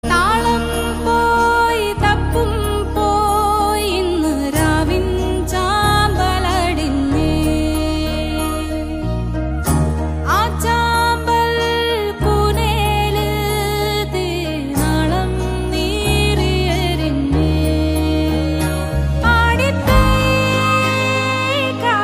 Female

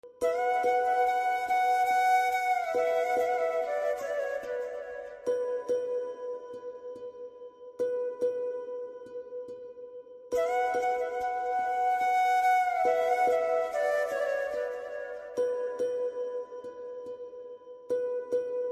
BGM Flute Ringtone

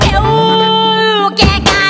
Message Tone Ringtone

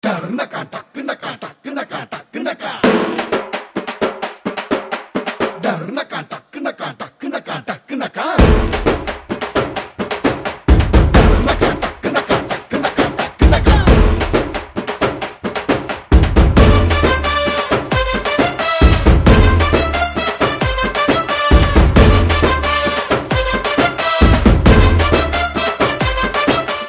Drum Beats Ringtone

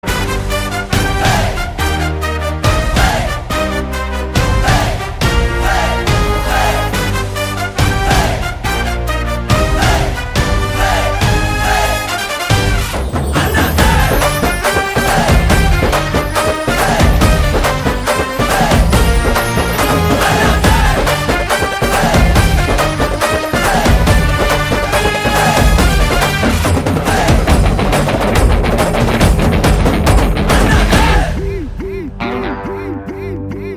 Theme Music BGM Ringtone

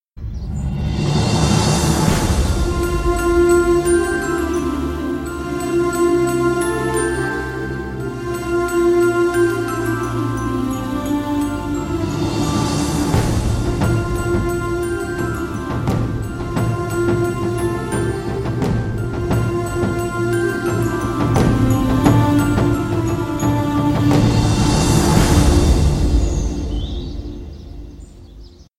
Sad BGM; Thillalangadi

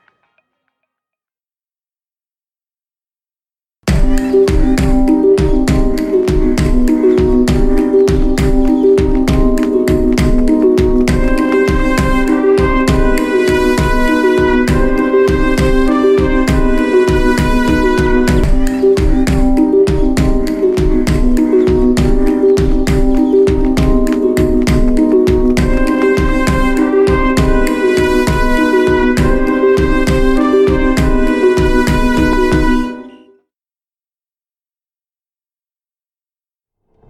Angrily Running BGM

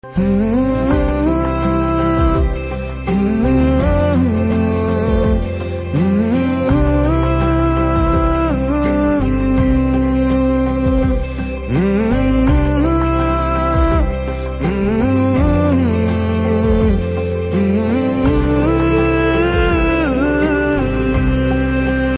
Humming; Love Aaj Kal

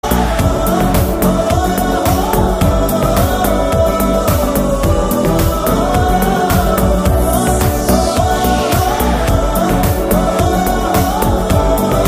Farewell Song Humming; Thank You

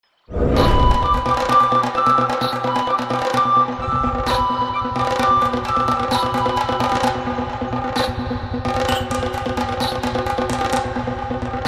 Instrumental; Aadu 2

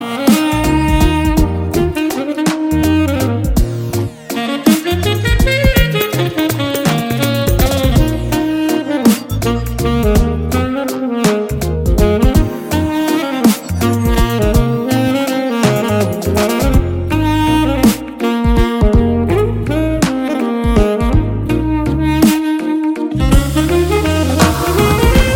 Saxophone BGM

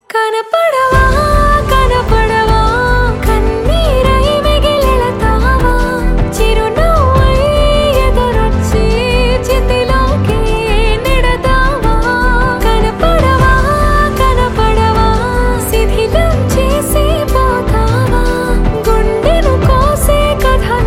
Female Version Ringtone

